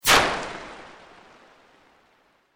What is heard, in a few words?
Sweep Organic Fast